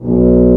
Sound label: Tuba mf B0; Digital Sound Factory Virtuoso